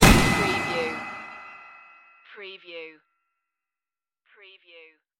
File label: space bullet strike